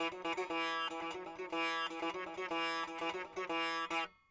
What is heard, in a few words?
sitar